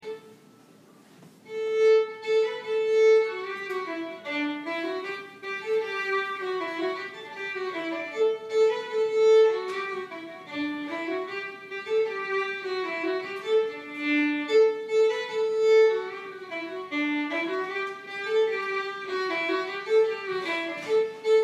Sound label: Breton Schottiche